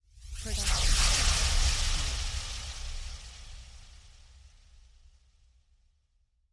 fly by; soundscrate

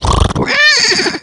comical horse; soundscrate

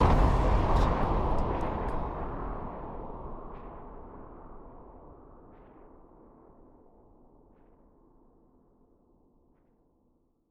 Wind Blast Explosion

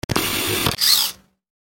camera zoom

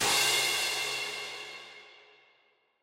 cymbal long; soundscrate

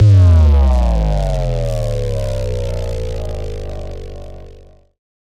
Bass Drop; SoundsCrate

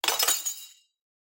Dropped Silverware Foley; SoundsCrate

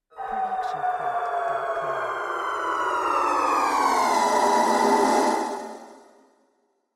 Screamy Downer; soundscrate

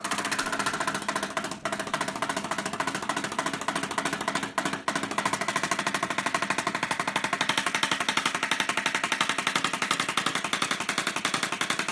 helicopter; soundscrate

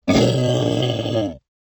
dinosaur low growl; SoundsCrate

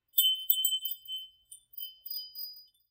Short Wind Chimes; SoundsCrate

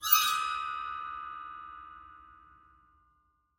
Eerie High Ring; SoundsCrate

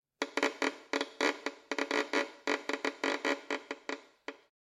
Violin Scratchy Noises

